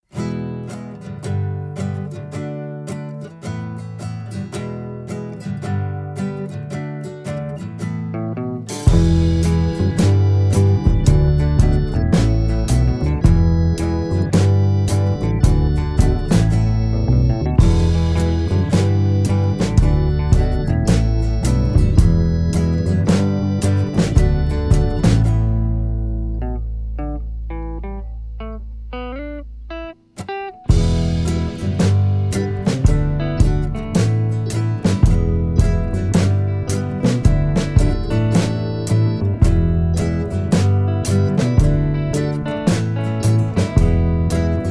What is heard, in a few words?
Instrumental